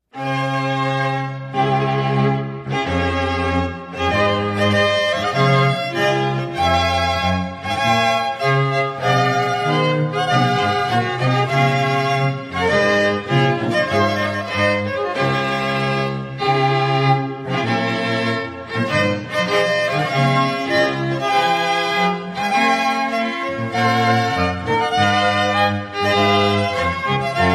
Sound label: overture from royal fireworks music; Handel